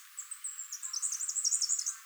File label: less exploratory male, canções destas aves